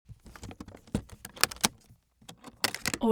object case aluminium open close

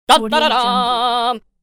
cartoon boy win fanfare

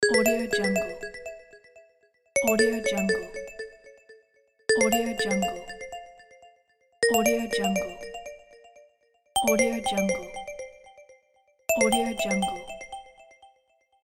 message alert

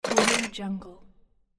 inventory close metal

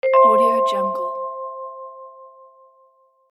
correct answer

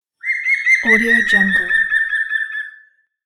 woodpecker singing

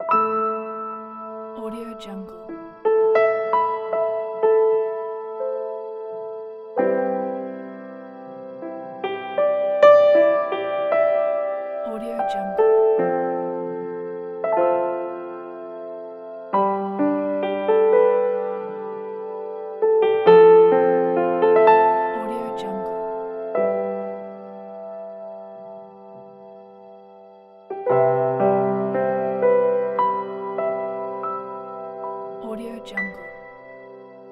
tender heart

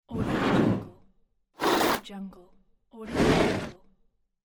slide; maxtecb

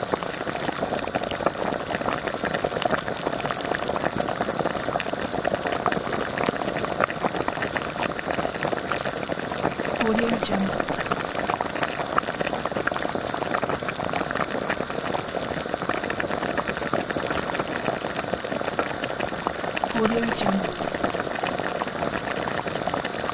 household cooking bubbling fruit sauce loop

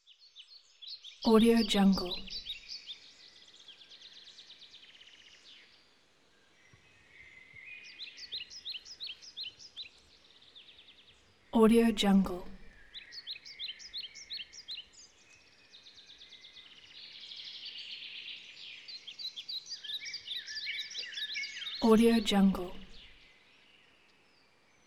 forest

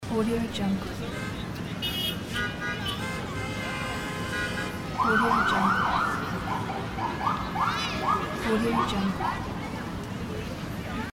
city car horns and police siren; steelcanarysound

